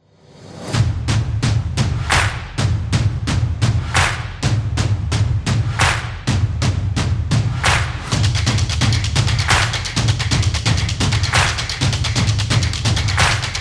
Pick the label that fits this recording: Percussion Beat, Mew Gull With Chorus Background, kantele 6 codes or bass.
Percussion Beat